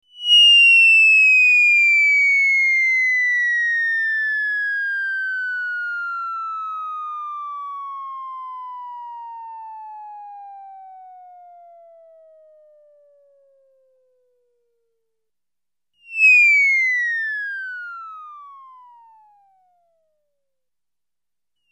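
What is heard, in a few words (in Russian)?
свист при падении с высоты комично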